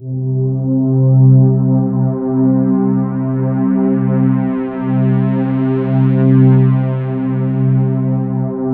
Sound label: C3, Synth Leads